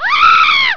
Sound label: SCREAM